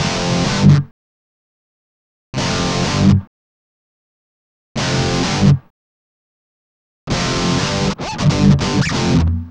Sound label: Guitar Licks